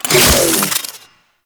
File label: Parazon Hack Out